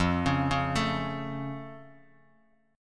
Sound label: F Augmented, Bass Standard; arpeggiated